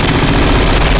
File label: shilka, ZSU-23; FGFS; oprf assets